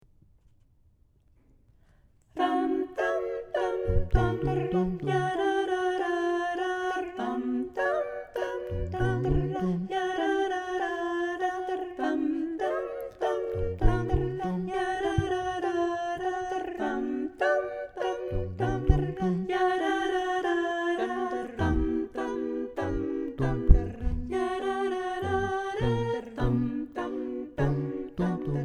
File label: Tango Exercise Mix